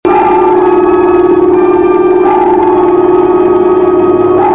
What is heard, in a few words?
ping